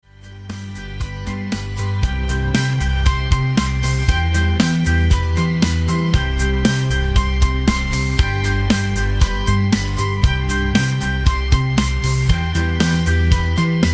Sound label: upbeat music